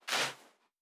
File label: Footstep Sand Walking; Character Sounds